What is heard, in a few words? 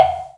whack, Cowbell Blocks